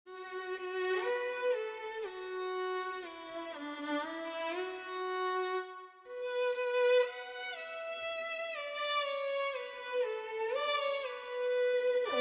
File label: Violin 2